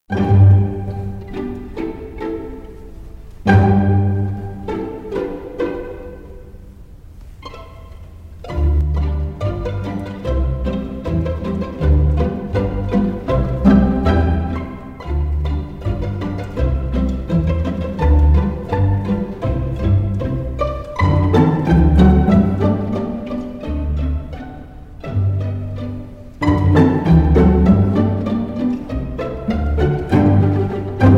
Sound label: Pizzicato Polka; Strauss